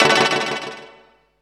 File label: notifier spring; ZTE Open-C